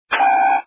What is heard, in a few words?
fail